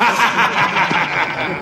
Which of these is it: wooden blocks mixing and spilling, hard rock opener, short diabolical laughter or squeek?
short diabolical laughter